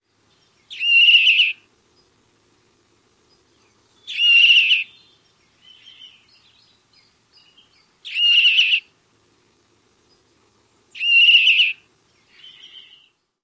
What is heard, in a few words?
juvenile call